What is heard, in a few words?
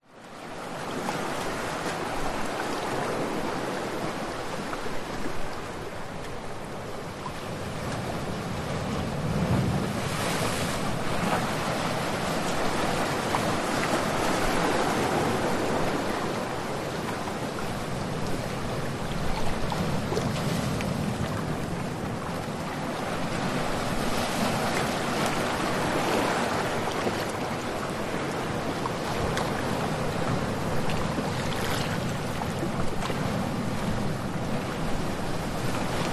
nature ocean waves splash against rocks shallow water rockpools close; zapsplat